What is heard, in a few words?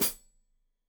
Hat Foot, Hi-Hat, Cymbals Stereo